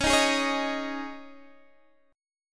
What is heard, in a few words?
C sharp Suspended 2nd C sharp Guitar Open D, chord